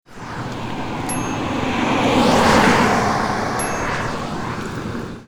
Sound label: Car Drive By